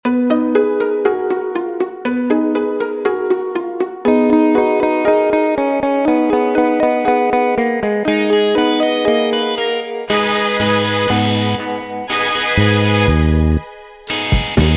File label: multi 1bar slerp; music vae